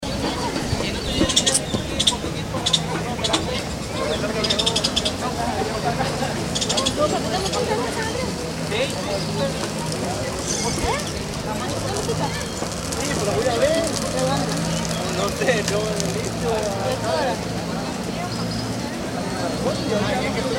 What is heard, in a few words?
san andres zocalo